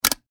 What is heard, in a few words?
Industry Dial Turn Switch Clicks; Factories